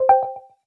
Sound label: message; DiscordCanary